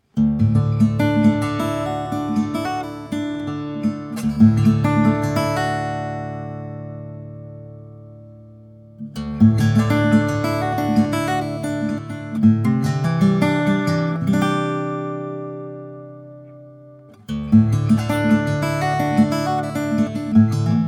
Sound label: Guitar